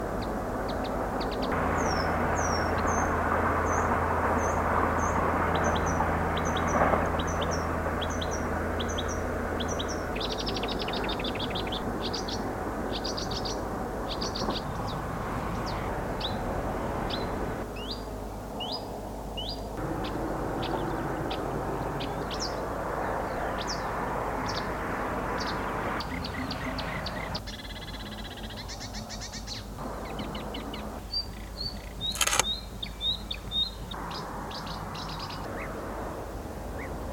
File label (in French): Verderolle